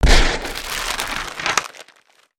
Paper shoot

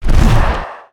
Wing Flap; creatures organic

